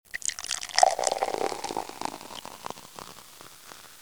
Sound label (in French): Soda; bruitage; Liquides